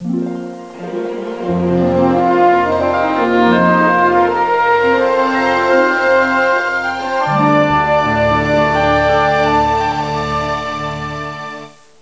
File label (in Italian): suonerie classica; musica classical